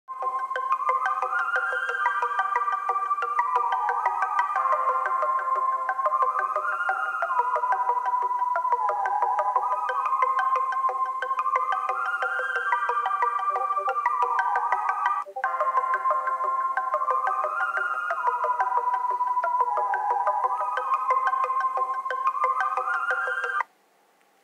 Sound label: spooky discord